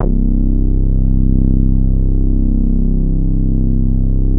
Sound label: PL MOOG; Wizoo - Powered Nord; STEREO LAYER